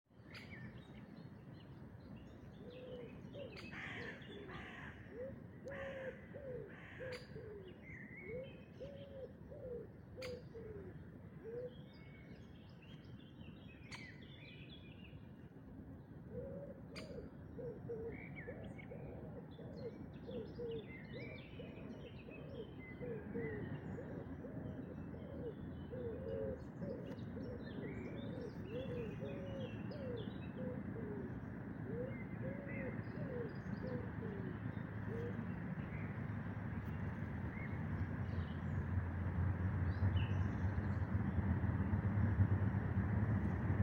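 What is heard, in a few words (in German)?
Dawn Chorus, Vogelgesänge vor Sonnenaufgang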